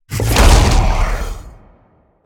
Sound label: activation; smite; cthulhu